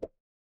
cartoon pop

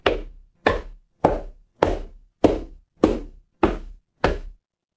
footsteps stairs

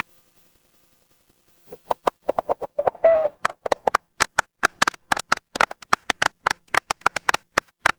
crowd applause

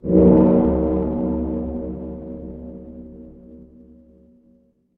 instrument gong

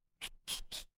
cartoon squeak

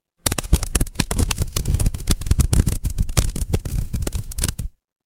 fire electric crackle

